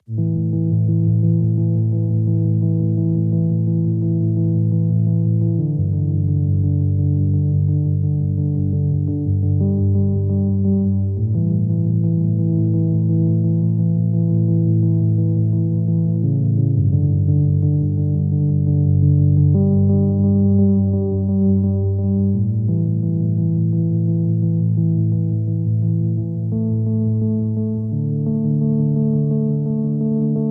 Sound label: Percussion